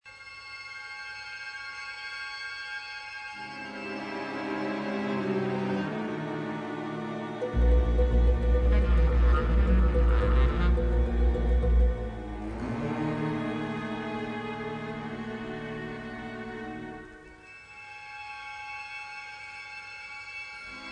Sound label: INSTRUMENTAL